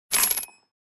coin

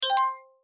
collect points; platformer game

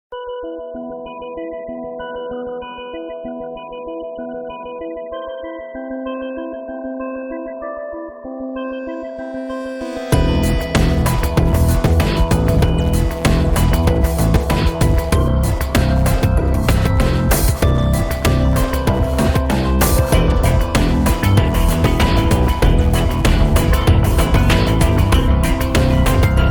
soundtrack

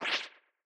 flesh, Battle SFX; Leohpaz; RPG Essentials Free

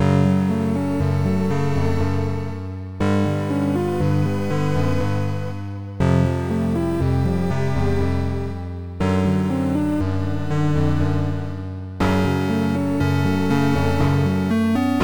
theme swamp, music; NinjaAdventure; Godot

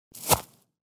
jump

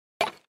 footstep; The Mercenary Chronicles

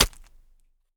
stab

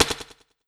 hit

